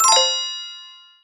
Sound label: Bells Downward